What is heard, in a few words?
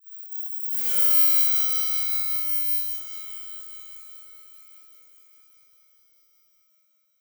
Texture Sci-fi Interference; MatiasMacSD; Sense